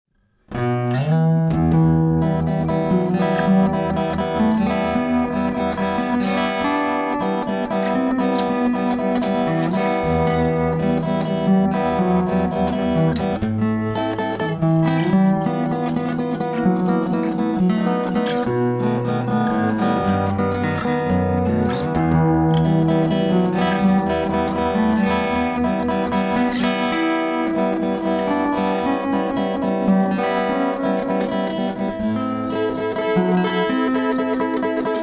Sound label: prelude, classical